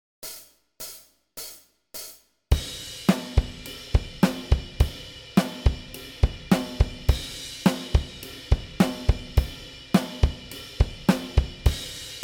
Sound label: Chorus, bass